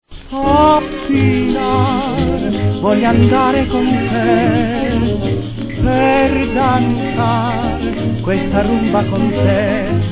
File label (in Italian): Rumba